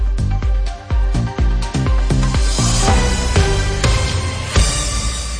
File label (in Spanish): jingle noticias